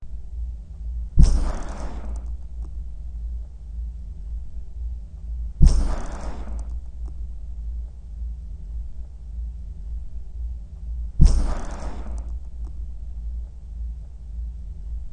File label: Scallops Coughing